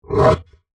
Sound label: Monster Grunt, Horror, Monster, Grunt, Creature; Monsters (Sound Effects:Horror); BlastWave